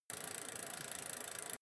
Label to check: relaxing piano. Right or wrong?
Wrong